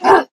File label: Grunt Hit; paladins; DarkLord